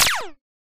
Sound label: taser, Gunshots